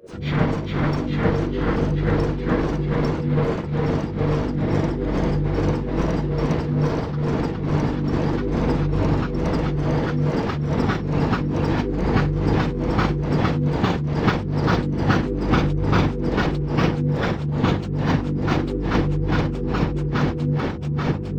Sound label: cold metal future machinery